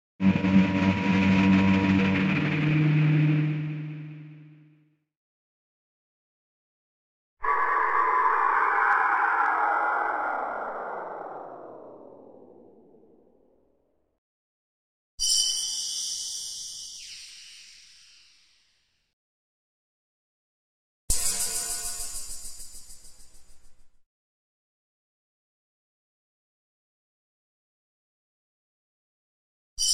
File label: scifi servos and shimmers set